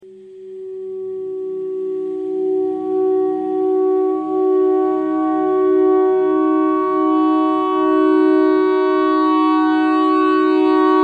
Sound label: clarinet notes e clarinet notes e thread noise